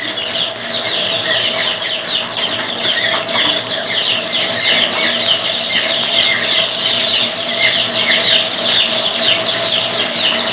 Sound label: baby chicks, farm animals; Sound Effects for Multimedia - Volume 1